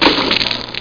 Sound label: Plashh; PobreJoaquim